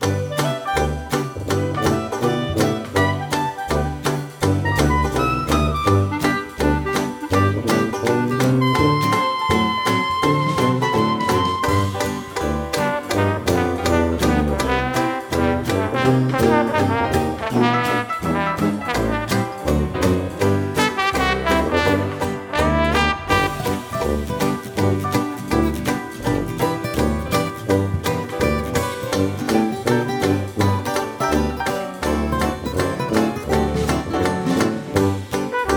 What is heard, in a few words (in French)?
Musiques rapides